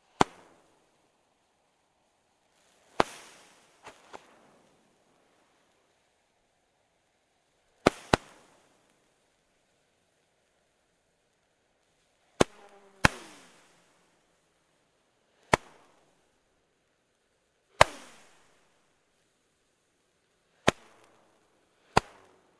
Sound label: Fireworks being set off